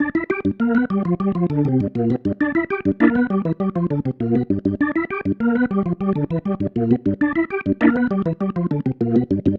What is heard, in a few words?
Pattern, Organ